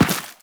Run Dirt, Footsteps; Warframe; Teshin

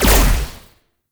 Amp Silenced Shot; Operators; Amplifiers; Drifter; Warframe

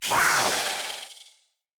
Kavat Vocal Attack Medium; Warframes; Khora

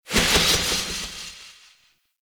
Impale Cast Release Tail; Warframe; Hoplite

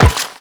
Run Metal, Footsteps; Characters; Warframe; The New War; Kahl

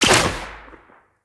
Bow Shot Charged; Weapons; Player; DendritBow

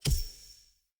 Stag Stampede Impact Hit; Powers

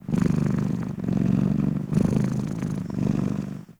Kitten Purr; Warframe; Catbrow

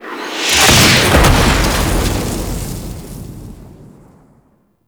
Canon; Rail Jack Archwing

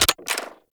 Arch Gun Alt Mech; Weapons; Warframe; Archwing; Hildryn

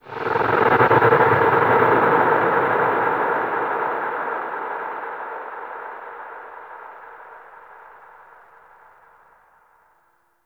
Texture Distant, Ambience; Warframe; Cephalon Weave

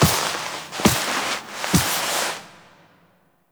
Epilogue Foley; Zariman; Cinematics

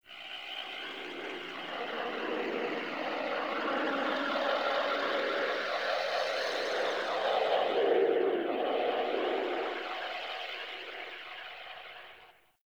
Venus Landscape Wind Atmosphere Anomaly, Ambience; Warframe; Venus Open World

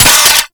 Hand Rocket Launcher Mech, Weapons; Warframe; Corpus

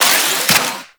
Nail Grenade Charge; Warframe; Trapper

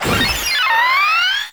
Maggot Attack; Enemies; Vocals; Warframe

